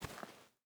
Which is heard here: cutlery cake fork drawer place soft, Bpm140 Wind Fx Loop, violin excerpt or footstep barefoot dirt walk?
footstep barefoot dirt walk